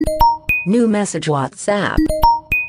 Nova Mensagem Whatsapp

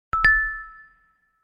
Huawei Notificação

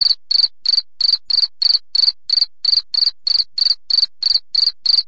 Barulho De Grilo

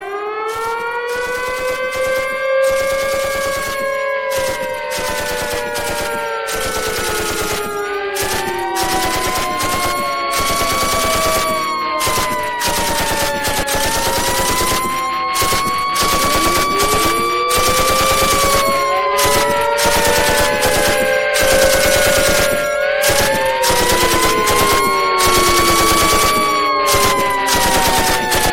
Alarmes de Guerra

